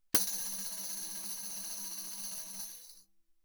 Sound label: tambourine shake roll ff; Percussion